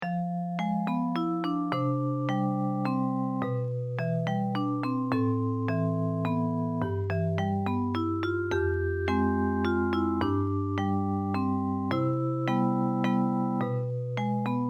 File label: elegant waltz